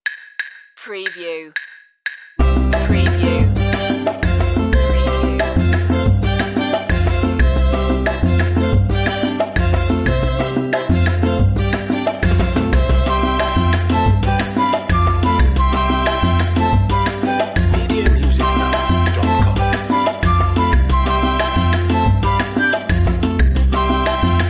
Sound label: charanga pachanga montuno